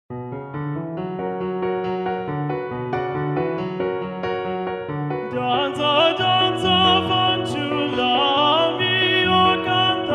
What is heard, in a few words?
danza danza, b min, chorus